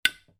household object hit against washing machine glass door window